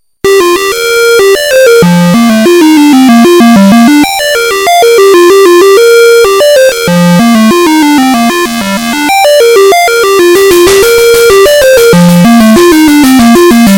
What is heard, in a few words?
drum n bass remix